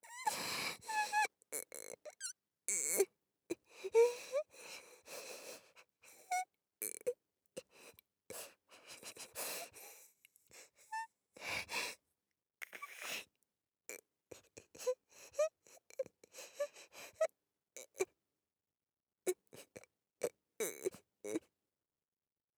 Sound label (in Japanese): うんち我慢ボイス